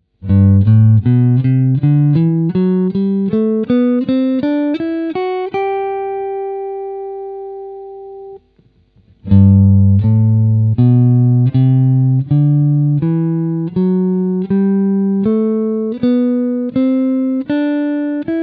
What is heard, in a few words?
Major Scale, guitar